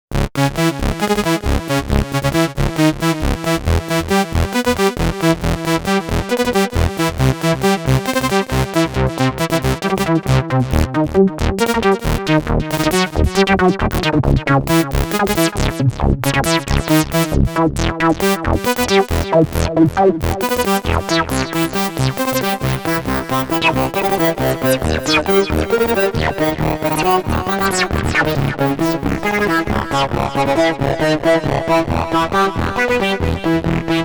Pro-3 Filthy Joy; Sequential

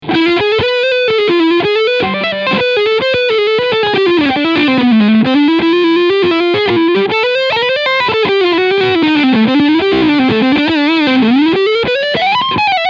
7 String Leadsound Front Pickup; Dean Guitars Exile Select

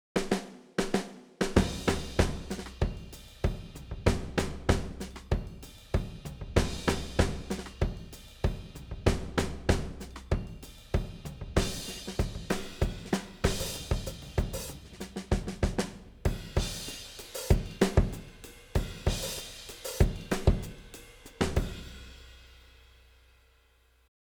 60s Early Full Vintage

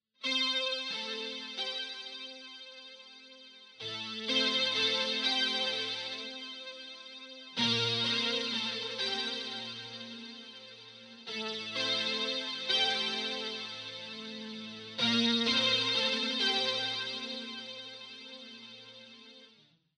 PWM LEAD

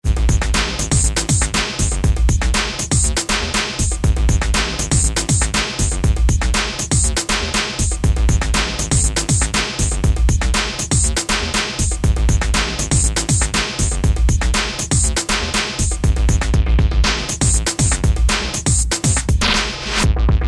Drum Bass

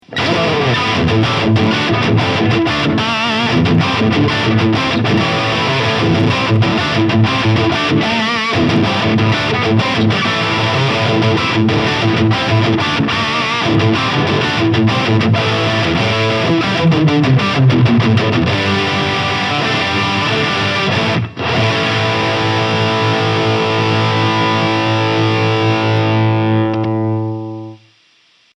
Engl Savage 120 MkII Lead

